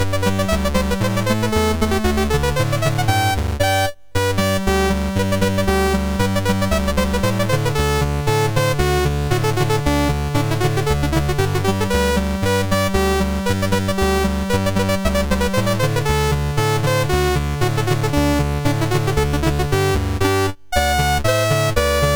Polka, MOS6581R3; Master Composer; Andria Polka

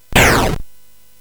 Frantic, CSG8580R5

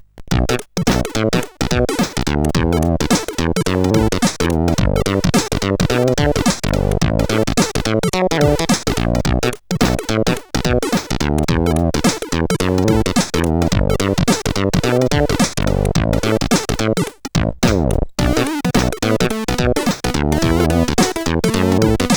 CSG8580

